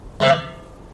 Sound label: honk lid